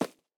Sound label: dirt, walking; factorio 2.0.55